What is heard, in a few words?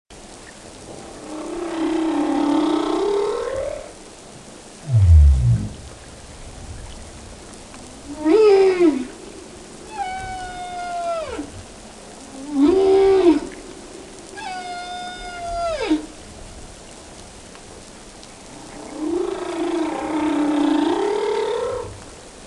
humpback whale song off the coast of japan